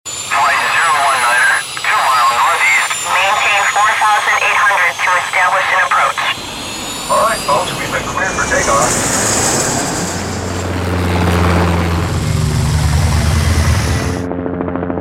A Transportation Sampler

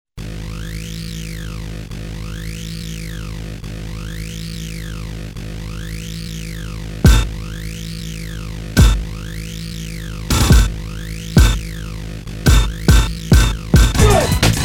We Got Spirit no vocals; Soundzabound Volume 3

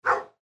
dog bark

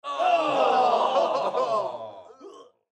crowd aww

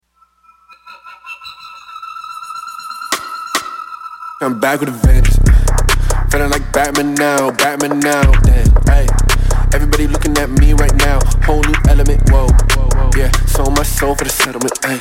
Vengeance with Vocals

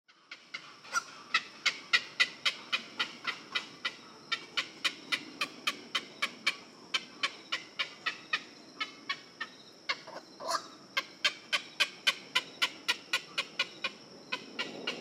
wetlands

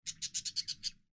paraquet squawk

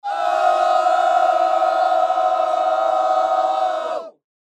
crowd ohh

